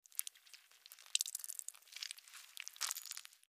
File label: MedicalScientific; Soundfx III